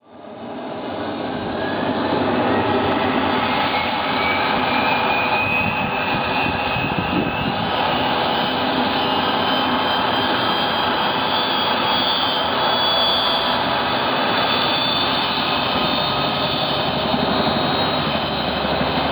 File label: TURBO